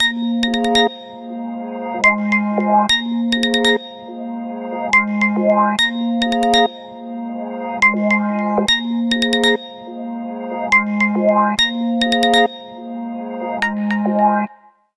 Ultramarine, ringtones

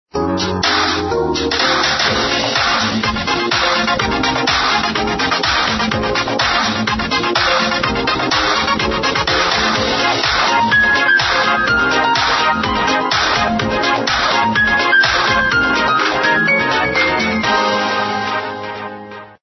Smooth

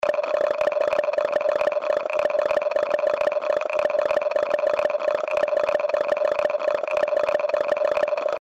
dice

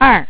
key; ZTE; R90; Keyboard

